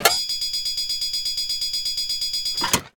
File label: steampunk mechanical metal element alarm mechanism; bluezone; sonniss gdc